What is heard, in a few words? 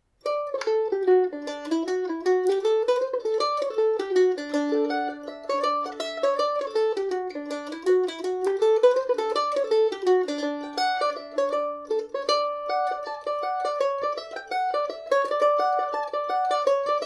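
market day D major, mandolin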